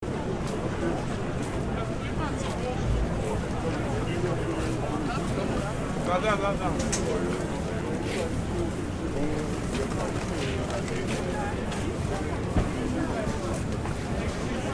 City street crowd noise urban ambience

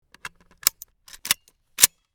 Bolt rifle reload; assault rifle

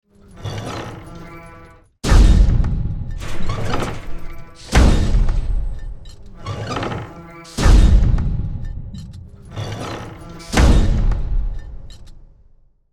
Heavy giant robot footsteps walking; robotic

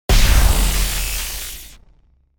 EMP Shockwave glitchy electric

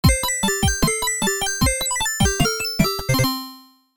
Retro game arcade level complete you win; level up

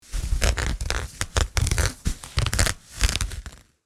Rough burlap scratching

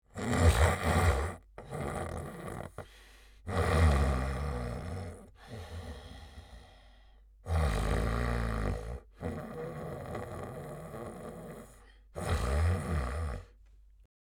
Mature woman snoring while sleeping

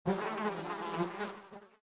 Flies buzzing close up

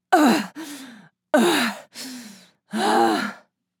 Woman moaning in pleasure

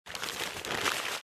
Hand crushing a paper bag

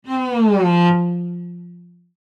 Cartoon funny comedy, Cartoon Descend

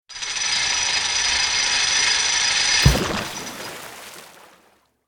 Ship anchor release water impact

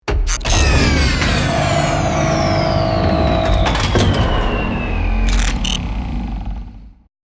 Robot power down shutdown; robotic

